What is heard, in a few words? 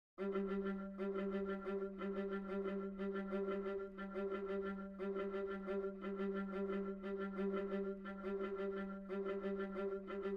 Viola; Overture or Something